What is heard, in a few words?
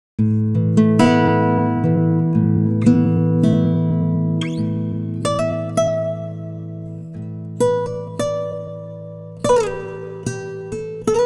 guitar, time stretch; usb uvi workstation